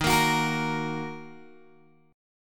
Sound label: Eb Minor Eb Guitar Open G